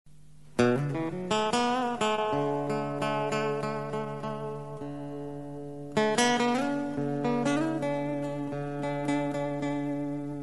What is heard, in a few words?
Instrumental